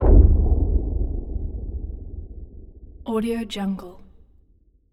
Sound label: asylum wet water cinematic bass impact; esmteam